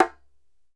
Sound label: DJEMBE, African Drum; E-MU Producer Series Vol. 7 – Old World Instruments